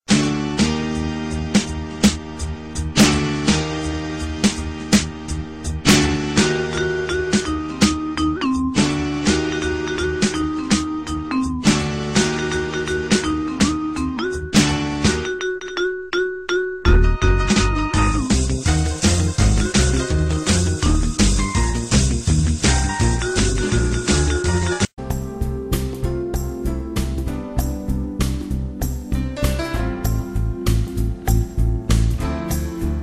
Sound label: fantasy dance